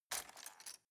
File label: cutlery plastic drawer grab soft